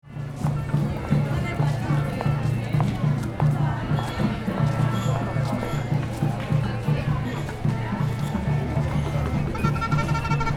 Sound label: Busy street with parade crowd and noisemakers; Urban, Town, and City